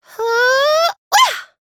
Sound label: ring; dqxi; serena